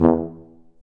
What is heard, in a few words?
C TUBA, stacc; Roland L-CDX-03 Disk 2; BRS Tuba